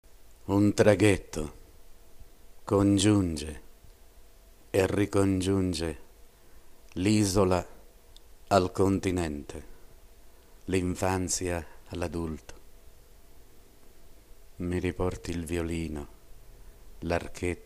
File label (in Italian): Violino; POETI E SCRITTORI DI MISTRETTA